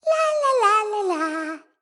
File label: lobby landing; smite; scylla